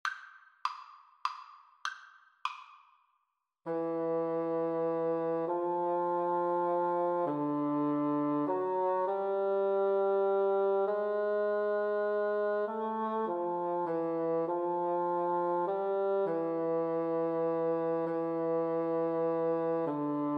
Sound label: we wish, Bassoon Duet